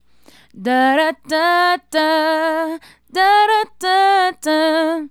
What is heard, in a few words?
Female Solo, Hip-Hop and RnB Vocals